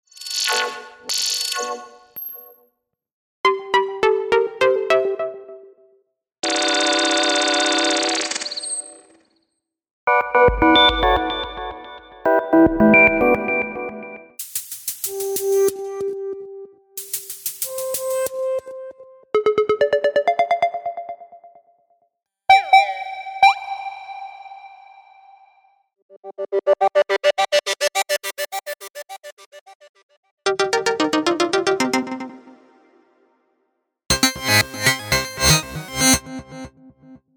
Ringtones

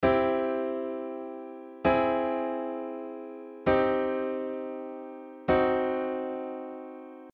main chord types

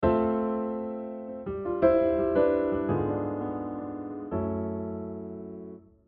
chromatic modulation

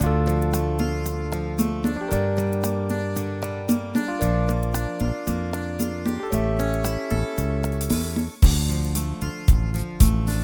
BEGUINE

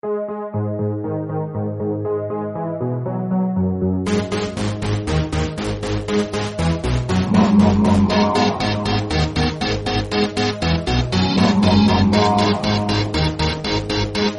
sms

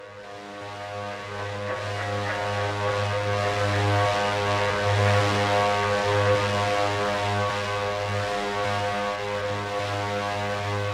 Guitar

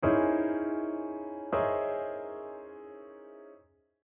cluster group inversions

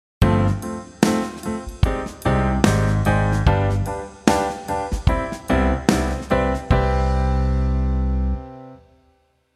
modal interchange

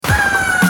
Vocal fx

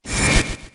sfx flashback

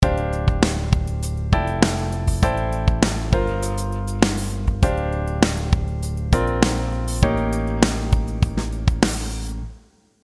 quartal 7

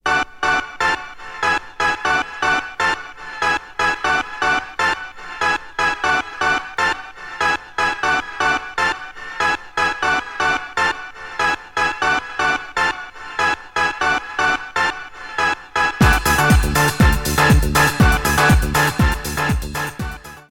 Synth stab

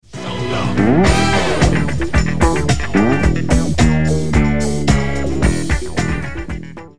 Stab & guitar & drums

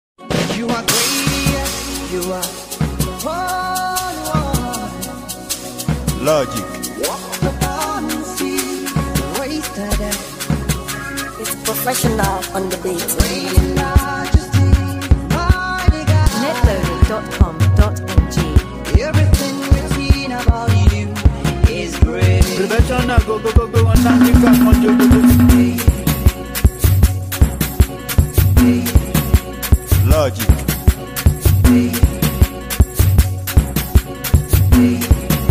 Professional Beat

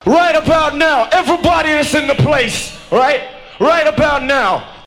Vocals ('everybody is in the place')

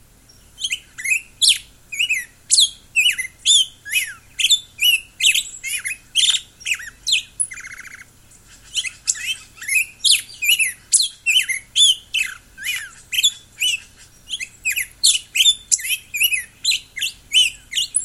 grosbeak

